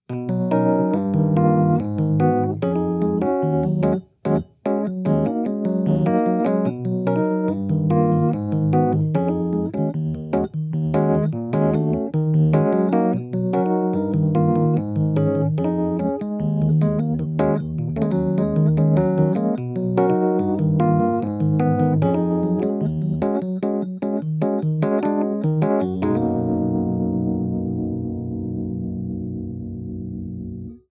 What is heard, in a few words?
Suitcase, electric piano